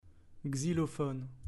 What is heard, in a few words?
xylophone